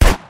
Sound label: Deagle